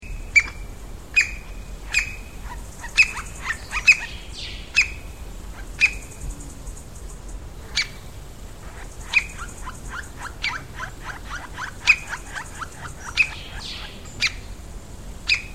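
Carpintero Real Común, Golden-breasted Woodpecker, Colaptes melanolaimus; cantos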